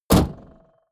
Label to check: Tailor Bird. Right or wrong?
Wrong